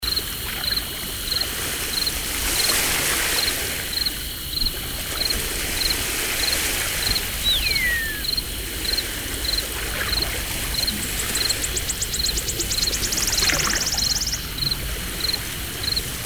Mer tres calme ete

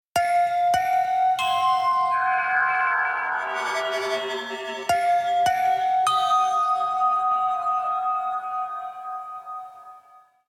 Futur link; Jingles medley

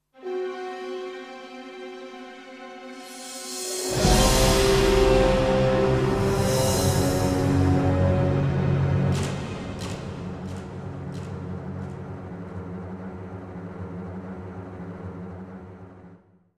transition

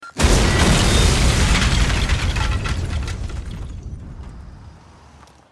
Bip boum; Explosions

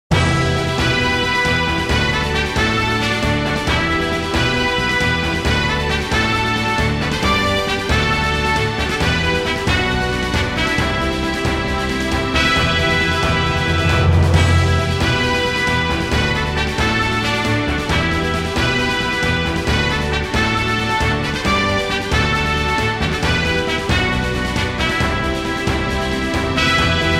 Fort; Jingles medley

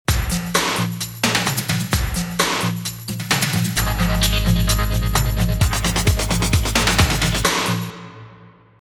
Sport Liaison; Jingles medley

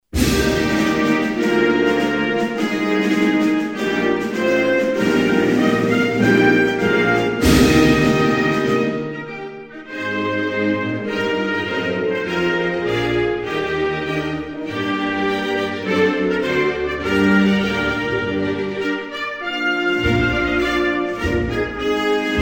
Portugal, Hymnes nationaux

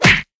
Coup de poing

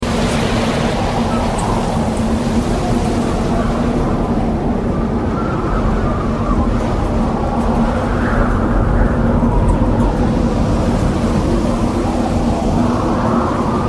Hurlements sinistres et feuilles, Vents

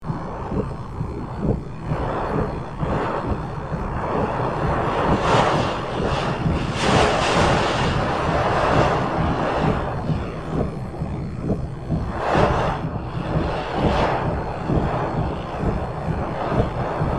Ouragan dans voiture, Vents

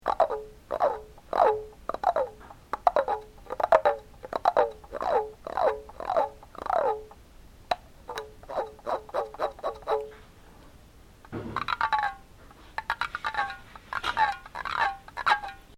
tambour

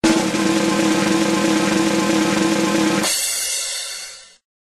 Roulement tambour; Roulement de tambours pour le cirque; Bruitages

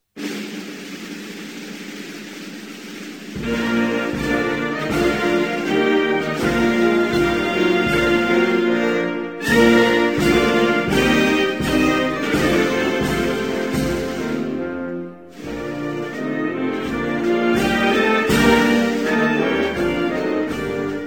Pakistan, Hymnes nationaux

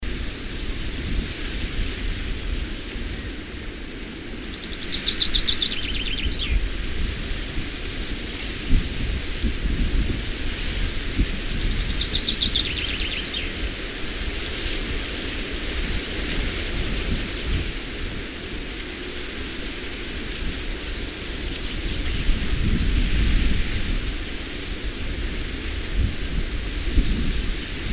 Brise d ete champ mais

